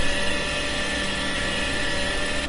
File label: vacum moving sound; Slendytubbies V2 Beta